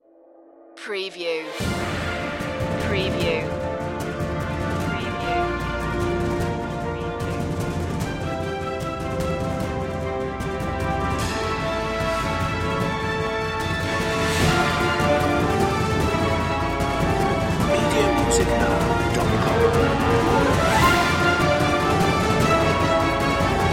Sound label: no choir